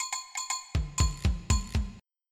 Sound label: SAMBA